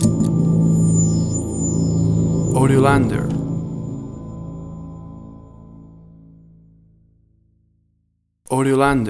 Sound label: A Dark Piano Theme Stinger